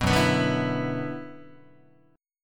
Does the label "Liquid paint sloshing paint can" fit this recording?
No